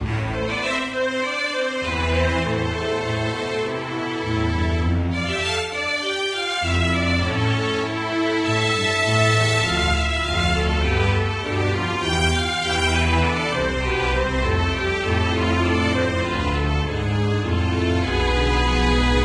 Castle, bgm; Mesukemo-Hime-no-Kaisuiyoku

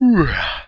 yawn; Undercroft

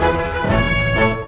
new level; Undercroft

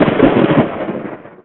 flash; Undercroft

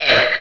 torso hit, enemy; Undercroft

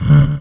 fat, enemy; Undercroft

